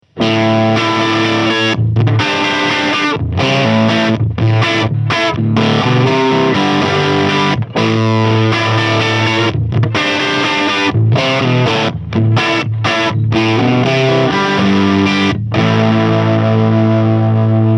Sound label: Electro Harmonix MIG 50 Single Coil Crunch Input 2